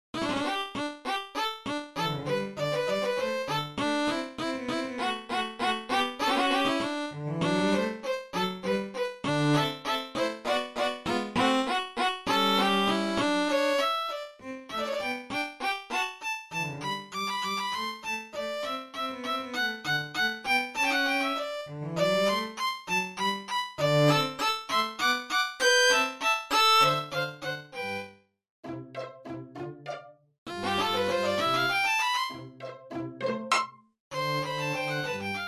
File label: menuet, trio for strings; 10 pieces enfantines en trio